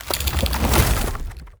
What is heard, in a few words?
punch